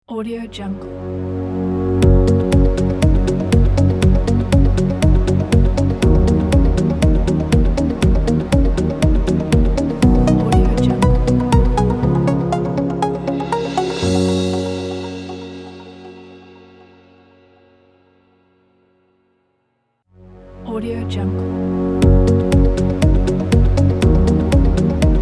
logo corporate pack

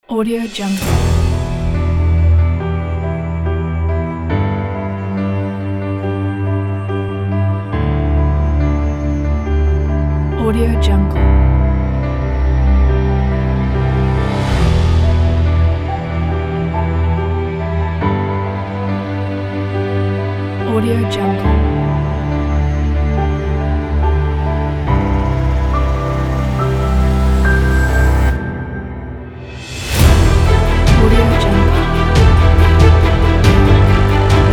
dramatic story; mr-audio

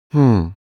human vocal male sigh pondering

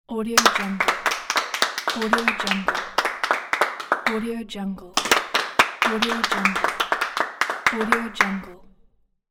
applause

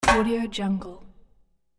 metal hit and ring soft double; hollywoodedge

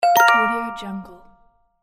correct

